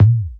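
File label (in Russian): кнопка клик близкий яркий звонкий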